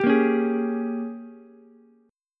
Ab Major 7th Suspended 2nd Suspended 4th Ab, down, Ukulele; strummed; JGuitar